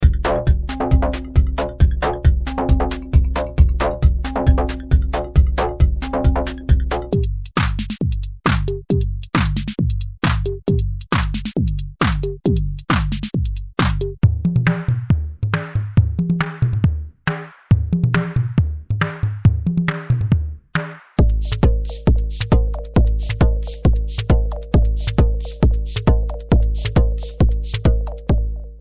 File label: ND2 4x4 Beats